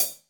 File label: HH, Drums; Bob Clearmountain Drums I